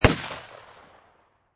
Pistol Rhuger 44 Magnum Single Shot Exterior At 45'; Gun; at 45 feet distance; Pistol Sound Effects